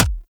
Weapon Punch Rifle; Warframe; Weapons; Rifles

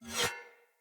Saw Dagger Whoosh Accent; Weapons; Player; Swords

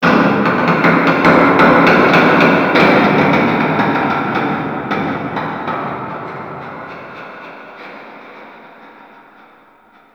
Shipyards Distant Metal Groan, Ambience; Warframe; Grineer Shipyards

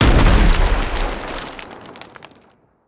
Siege Engine Cannon Proj Explosion; Weapons; Enemy; Artillery; Ode Siege Engine

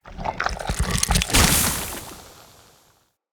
Corrupted Sproutfolk Summon Burst; Enemy; Combat

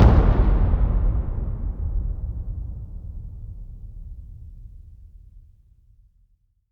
Taiko Drum; Warframe; Dojo Props; Taiko Drums

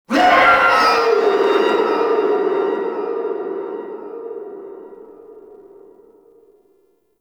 Stadium Infested Medium

